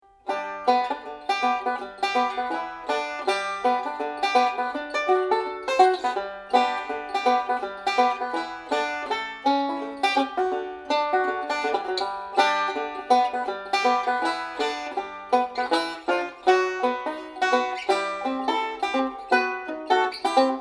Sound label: banjo ragt